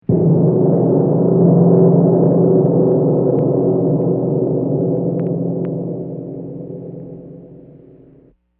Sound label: Gong Huge Roll; Gongs